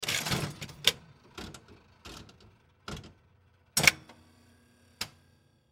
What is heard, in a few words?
Slot Machine Pull, Slot Machine Pull Lever Antique Mechanical; Casino; Slot Machines; SoundEffects-Blastwave; Sports